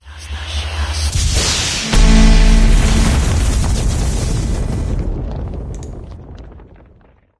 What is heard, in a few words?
teleport